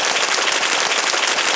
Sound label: APPLAUSE; East West - Global Sounds; SC-55 FXS